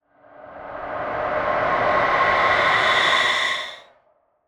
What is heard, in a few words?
ghost rise; soundscrate